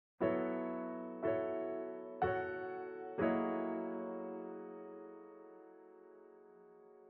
Factory Boss Piano; music

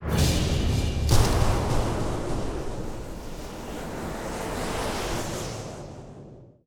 Abyss Door Break; gamerip

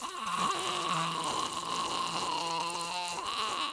Mage Blob Charge Loop

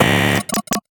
Drone

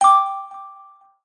Sfx Basketball; Sfx Basketball Fever

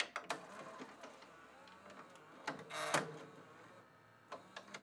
VCR Turn on; Starbites

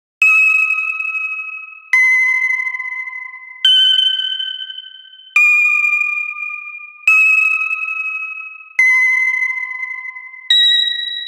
Ding

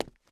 Footstep Run Base; starbites

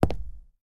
Footsteps Concrete Shoes; starbites

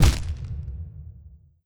Damage Less Harsh

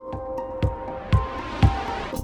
break1 4 beats

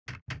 Heavy Two Steps Back

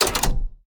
Item Machine Scroll; Santuario

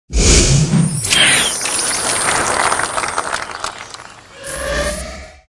Music; Music Frontend

